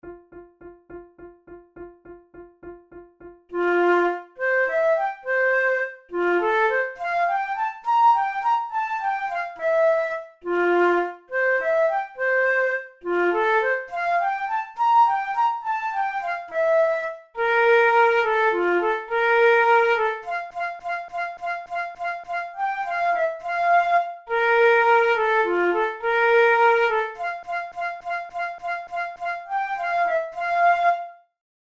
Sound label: pour la flute duos presto; devienne; nouvelle methode